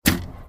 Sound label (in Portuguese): toque; tetris